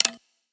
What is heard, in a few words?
gambler mouseclick; BARs 7s; wazdan; ultrafast